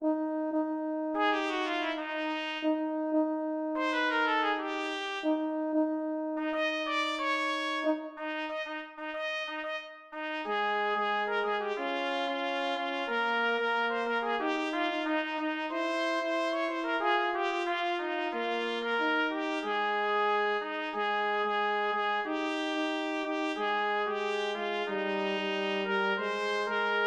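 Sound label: jazzy we wish you a merry christmas trumpet horn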